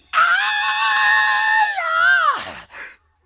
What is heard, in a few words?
aaaaahyeah